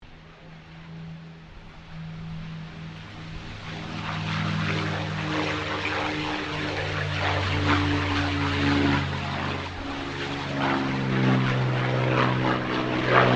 AIRCRAFT CAC CA-18 MUSTANG MK23 TAKEOFF WINDY; CA-18 /P-51 Mustang; Airplanes; Vehicles; Aircraft Collection; Twistedtracks

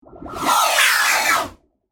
Twisted Theremin; Miscellaneous Cartoon Sound Effects

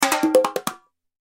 Cartoon Percussion Fast High Rhythm; Cartoon Music; Cartoons; Blastwave

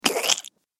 Dropped Into Swamp, slimy

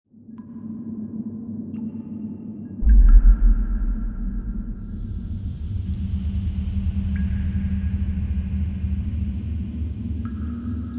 Horror Scifi Soundscape; Horror Scifi Soundscape - The Dungeon Cave; Loop; Fantasy horror; Scary Soundscape Sound Effects

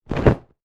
Body Fall Mat, Gymnastics, Hard; Sports; BlastWave

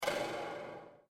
Clank metalic reverb; User interface feedback sounds

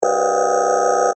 Scanner Mechanical, Scifi, Motor, Whine; Science, Fiction; Tech

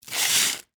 Sand Paper Wood; Hand Tool Sound Effects; SoundEffects-BlastWave

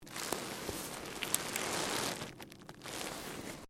LEATHER MOVEMENT; Material components for constructing more complex sounds; Dressing (Sound Effects:Human)

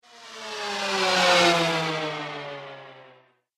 Space Bike Slow Flyby, spacecraft sound effects

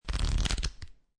Playing Cards Fan Flip; Casinos; Lynne Music

